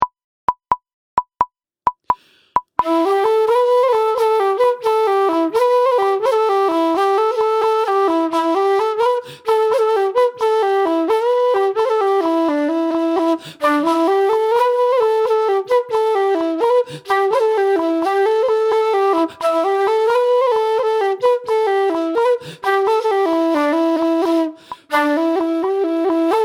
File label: Oblique Jig, irish flute